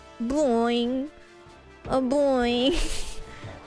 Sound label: boing